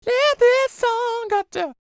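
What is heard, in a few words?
falsetto, R&B Dance; Spectrasonics Vocal Planet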